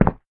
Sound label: Tile short